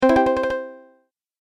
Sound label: Message Alert Sound, musical; Twistedtracks